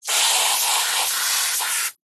household water jet spray from hose on flip flop cleaning med burst